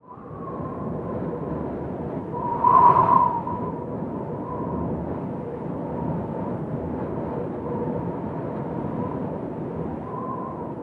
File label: Wind